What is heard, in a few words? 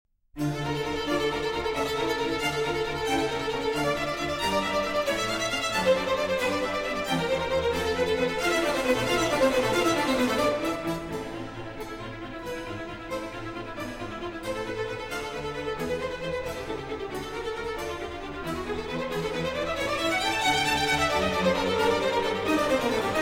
Concerto for Strings and Continuo in D minor